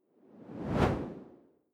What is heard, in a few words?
whoosh simple low; swooshes-swishes